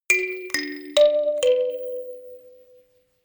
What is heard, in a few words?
notification kalimba rattle sequence minor